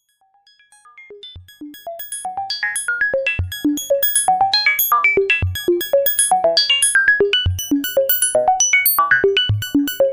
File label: sh5 selfresonance